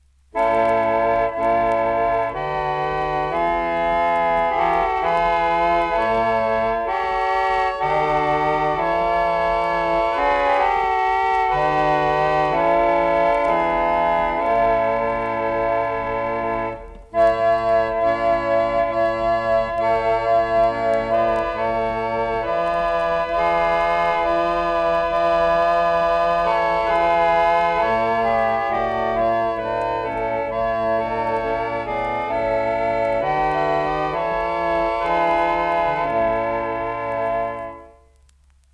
Celestina

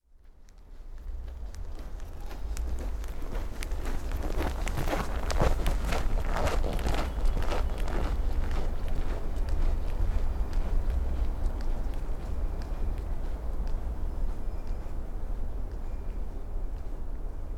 snow, Sergiyev Posad

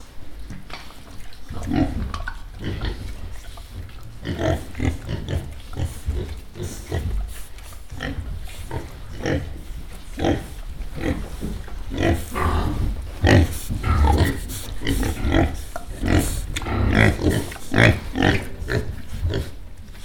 pig binaural

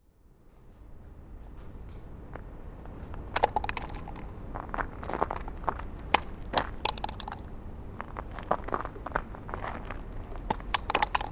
plastic cup, Sergiyev Posad

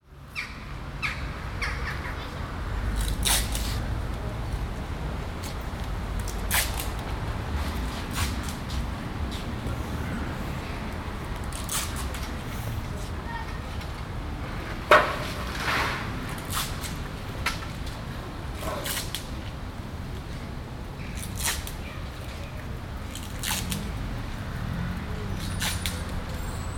bill posters, druzhby street, sergiyev posad

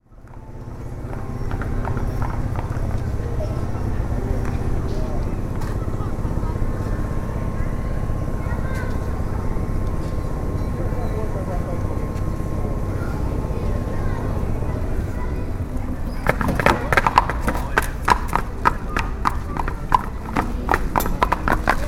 horse carriage, Sergiyev Posad

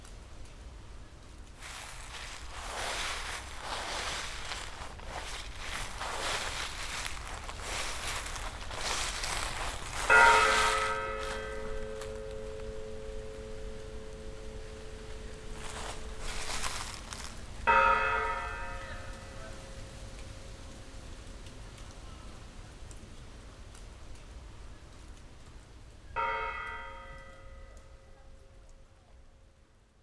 bells; muranovo

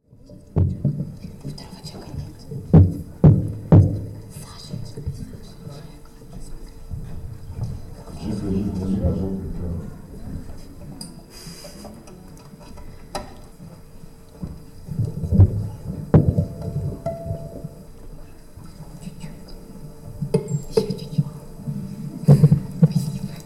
mic moves

